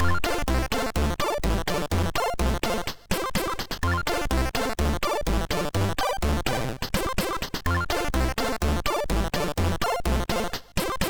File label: Guldkornekspressen Lvls, MOS6581R2